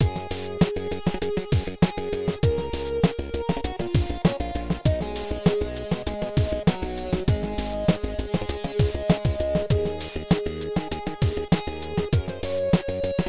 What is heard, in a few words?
UI Menu